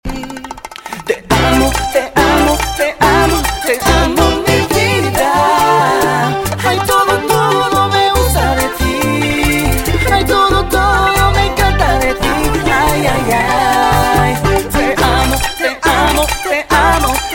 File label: Bip